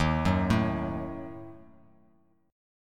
Eb Diminished, Bass Standard; Ebdim arpeggiated; x,6,4,2; JGuitar

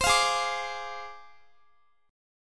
Ab 6th Flat 5th Ab, Guitar; strummed

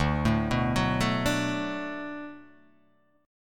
D 6th D down, chord

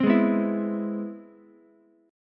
C Augmented Major 7th C down Ukulele; strummed; chord; JGuitar

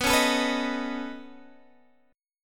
C sharp 7th Suspended 2nd Sharp 5th B

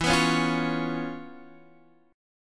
C sharp 7th E; C#7/E; strummed; JGuitar

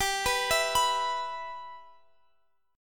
B Suspended 4th Sharp 5th G

